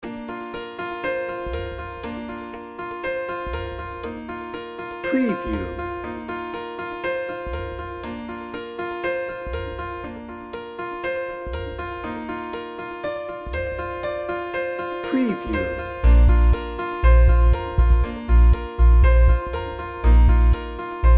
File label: cinematic emotions